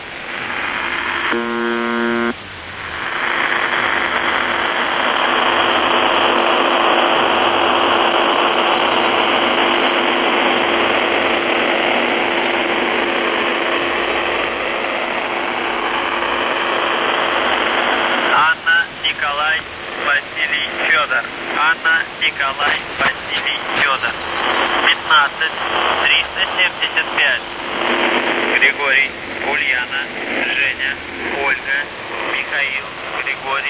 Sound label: buzzer usb msg